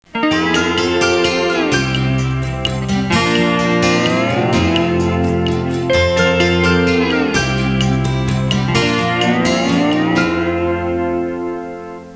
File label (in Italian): suonerie musica hawaiian